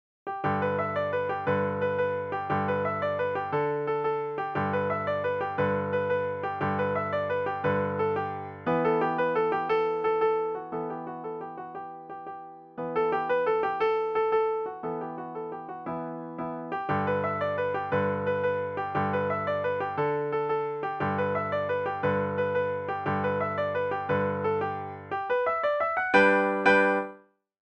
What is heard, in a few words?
the campbells are coming piano solo